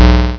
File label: Bolt; IQ2 Demo